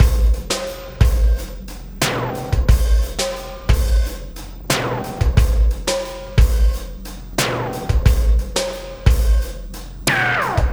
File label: Sky Lab Beat, Processed Drumkits